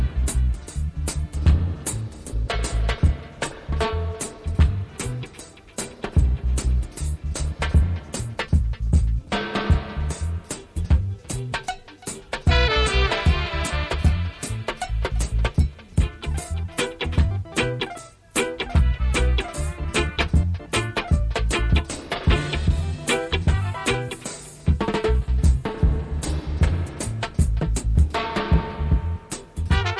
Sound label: reggae